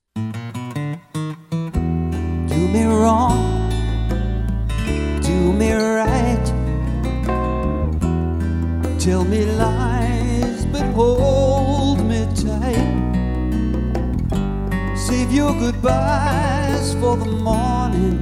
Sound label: Cover Vocals and Instrumentation